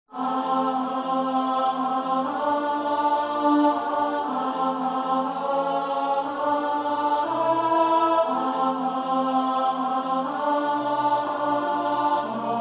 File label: Nobody knows the trouble I've seen Alto